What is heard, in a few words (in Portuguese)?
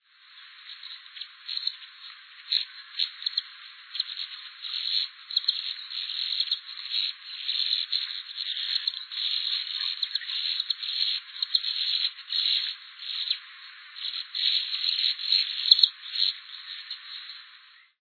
andorinha-de-coleira